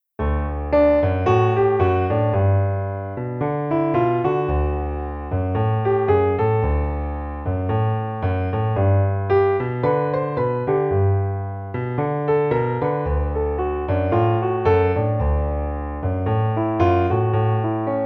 Boogie, Piano seul

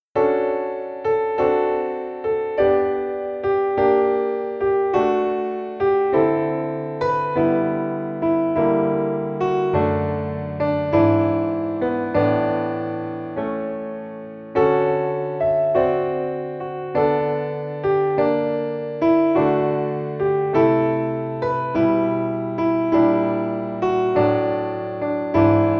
Ballad, Piano seul

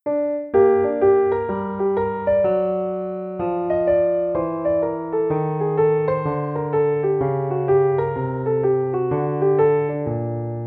Holidays End Piano seul; Jazz

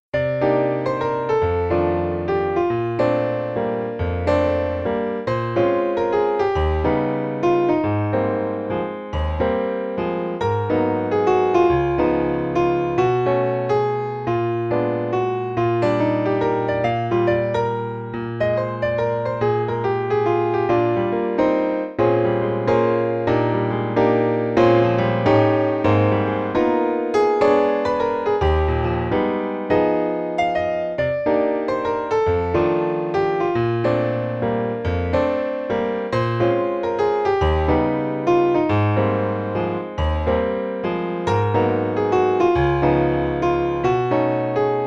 Jazz Waltz Piano seul

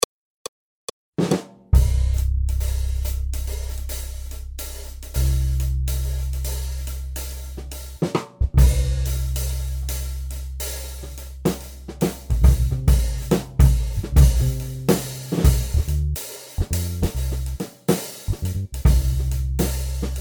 Blues Accompagnement seul; Jazz

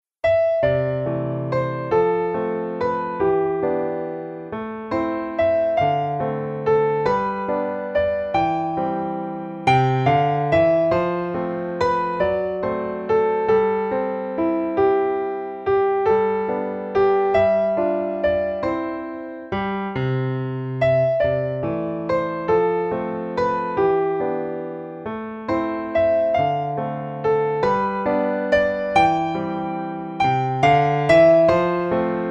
Waltz, Piano seul; Jazz

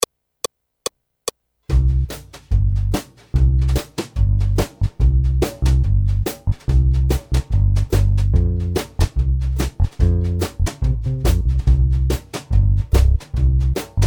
rag Accompagnement seul; Jazz

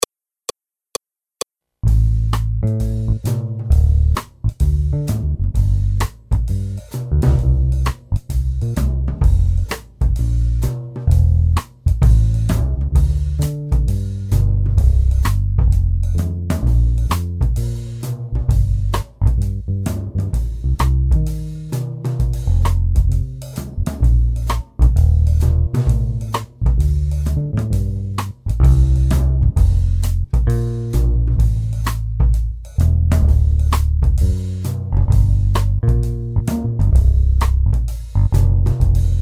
II V Modulation, Accompagnement seul; Jazz

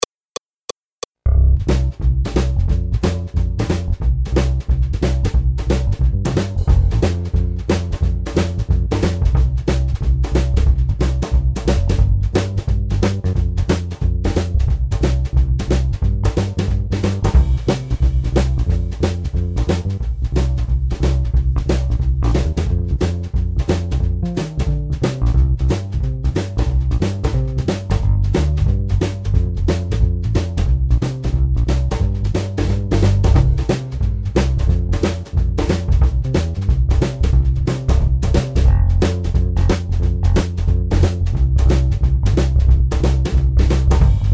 boogie Accompagnement seul; Jazz

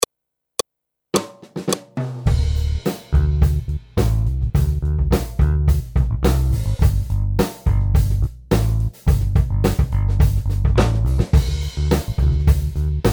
Funky, Accompagnement seul

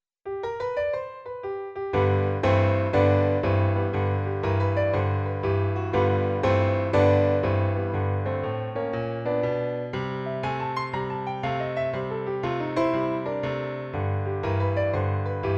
Blues'n boogie Piano seul; Jazz

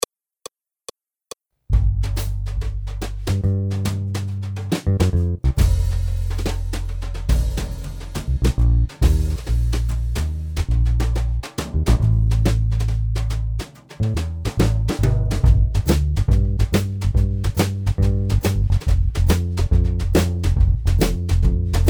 Eight bar boogie Accompagnement seul; Jazz

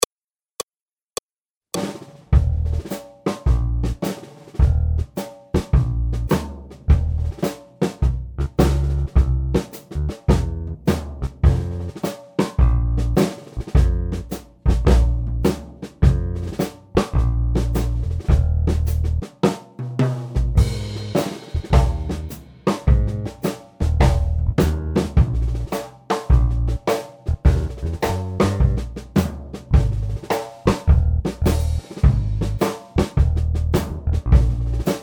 rag Accompagnement seul; Jazz

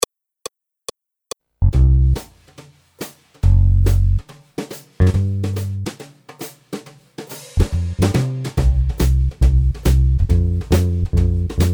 Boogie on the Swanee river Accompagnement seul; Jazz

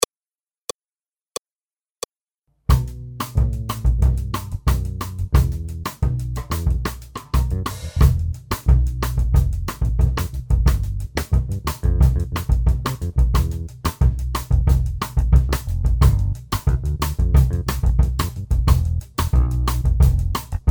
Syncopation, Accompagnement seul; Jazz

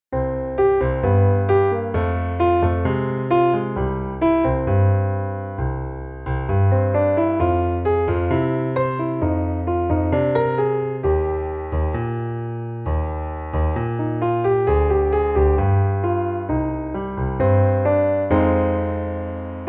bossa, Piano seul

